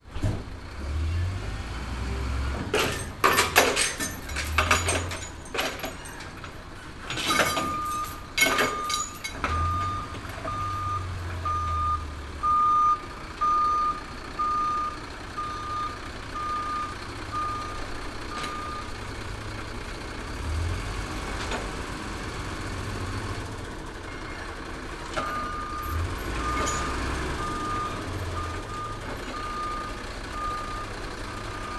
transportation truck move after unloading skip loose chains bang around reverses